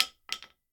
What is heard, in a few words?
Drop Wooden Spoon; Prison Architect